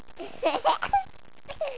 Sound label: giggles